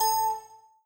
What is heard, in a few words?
Windows Ding